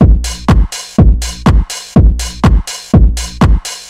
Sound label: Hard House